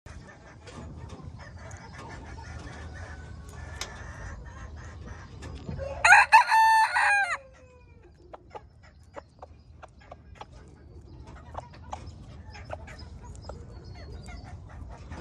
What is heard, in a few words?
Cubalaya; کوبالایا